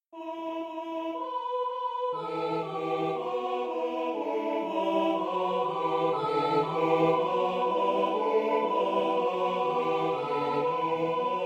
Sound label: god rest merry gentlemen satb